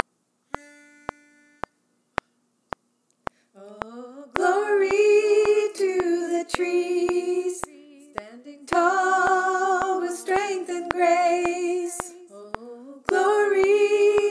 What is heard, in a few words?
soprano; The Freedom Choir